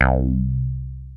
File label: POP UP BASS, mono poly; TECHNO-BWARE